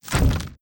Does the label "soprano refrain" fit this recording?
No